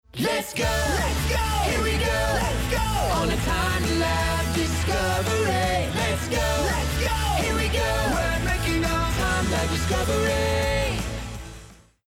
theme music beginning; time-lab

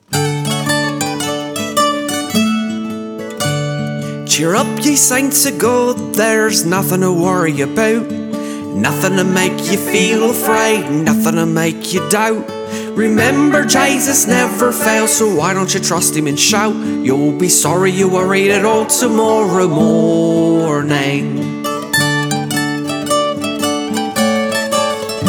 cheer up ye saints of god, simple songs for kids; emerald crossing

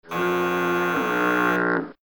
wrong fx; time-lab; presentation

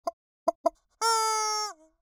long irritated chicken clucks; wonder-junction

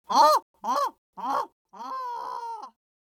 taunting goose flying away; emerald-crossing

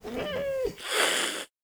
kitten hiss; incredible-race

